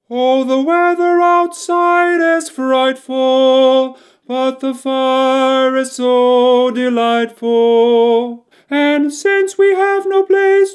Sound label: tenor